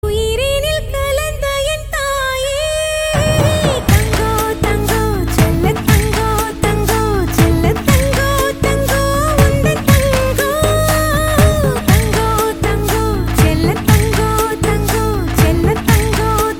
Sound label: female version